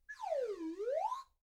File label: slide whistle